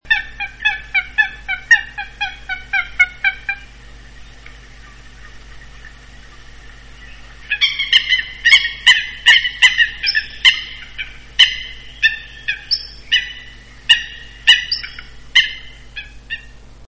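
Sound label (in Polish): łyska, Fulica atra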